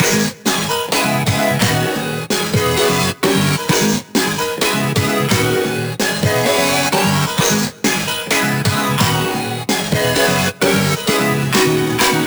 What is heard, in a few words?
Special Sounds Root G# 130 BPM; Vengeance Samples; VEH3 Special Sounds